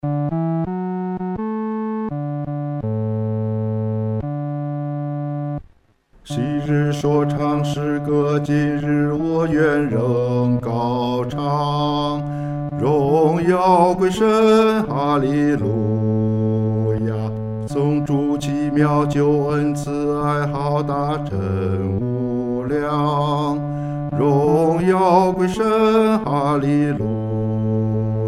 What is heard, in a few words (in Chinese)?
独唱 第四声; 荣耀归神